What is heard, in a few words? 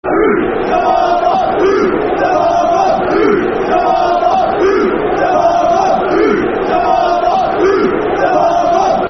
Ooh Zemmama Football Chant (Soccer Song); Hibernian Hfc